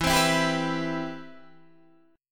C Major 7th, E, guitar chord

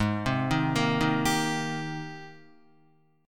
Ab Major 9th Ab, Guitar; arpeggiated; guitar chord; 3,3,3,3,3,3; JGuitar

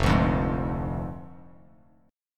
F Minor 6th F down Bass Standard; Fm6; strummed; JGuitar

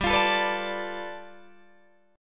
G 9th, Guitar Open D

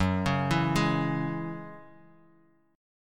G sharp 7th Suspended 4th Sharp 5th; 2,4,2,1,x,x; chord shape

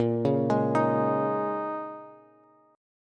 Bb Minor 11th Bb down Bass Bass Standard; arpeggiated; JGuitar

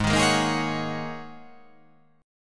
G sharp 9th Suspended 4th G sharp

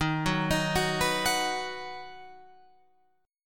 Eb Minor Sharp 5th Guitar Open F; Ebm#5 arpeggiated; JGuitar

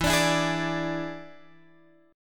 E 7th E down Guitar Open G

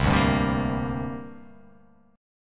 C 7th Suspended 2nd

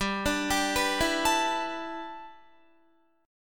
G 6th Add 9th G Guitar, chord; G6add9 arpeggiated

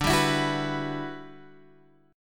E Minor 9th D, down, Guitar, Standard; Em9/D Chord {X 5 5 9 7 7} strummed

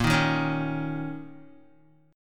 A sharp Minor Double Flat 5th, down, Guitar; A#mbb5 strummed; JGuitar

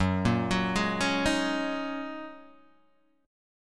Gb 6th Add 9th, Guitar, DADGAD

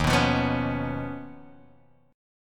Eb 7th Suspended 2nd Sharp 5th Eb, Guitar Drop D; 1,2,3,4,2,x; down

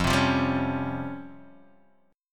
C sharp Major 7th Flat 5th F; C#M7b5/F strummed

